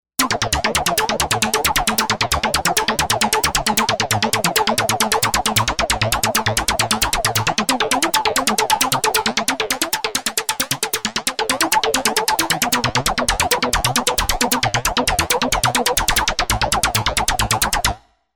Flang Whirl, synth

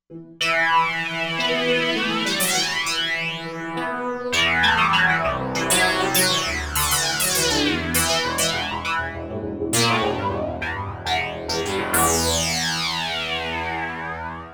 Roland Fantom XR; SBF Nozer

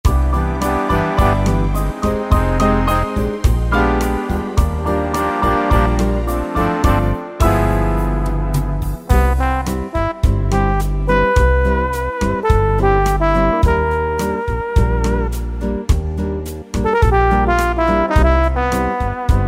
Slow Bossa, Latin; Factory Styles

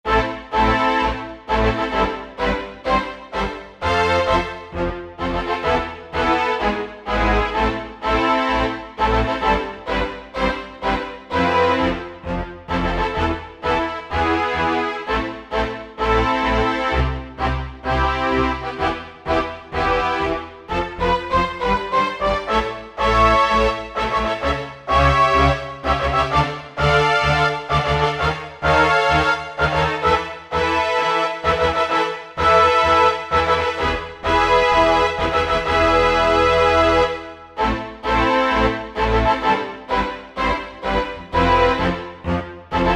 Combo, E-mu Proteus VX

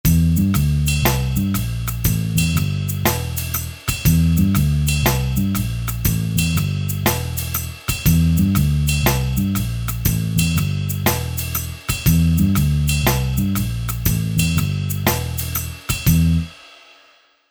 Jazz

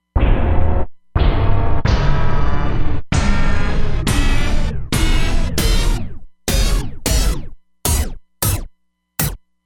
Mix Hit

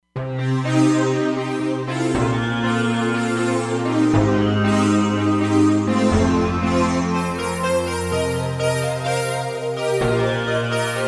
Roland Fantom XR

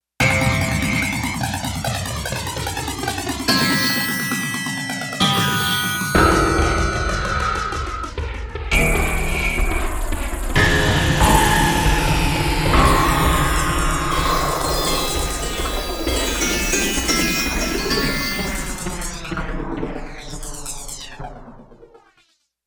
FX Menu

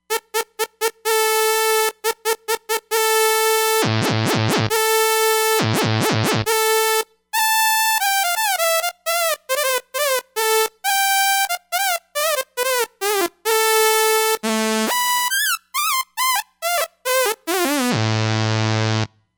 Dirty Saw, Roland Fantom XR

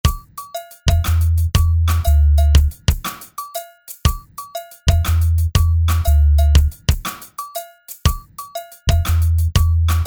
Reggae

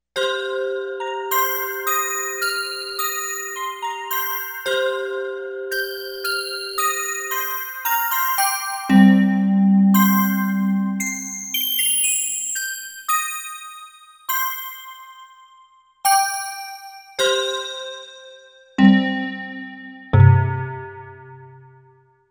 China Bell

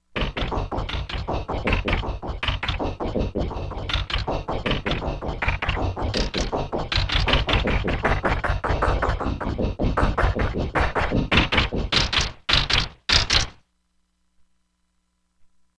Sand Walk, Korg MSC-16S; Environment

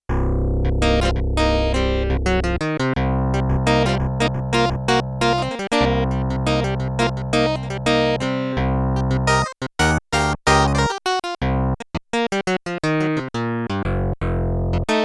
Clv, Yamaha TX16W; General Performance

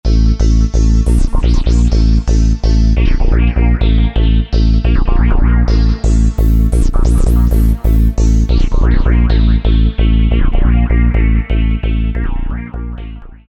Roland JP-8000; Factory Performances; Road To Goa

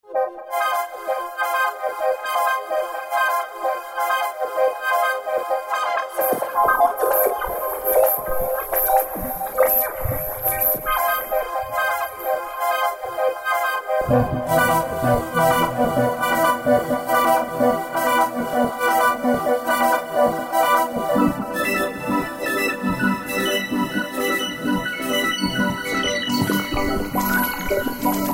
Vectoring, Roland JD-990; Factory Patches

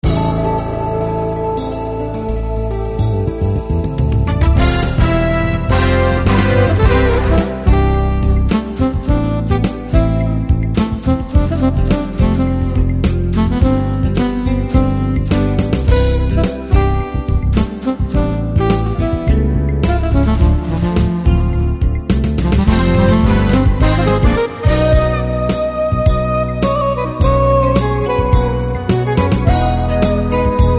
TSax; Yamaha YPT-400; Factory Songs

